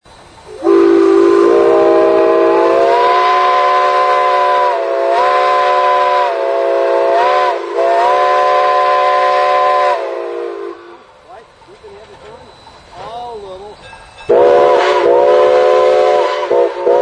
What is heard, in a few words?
horn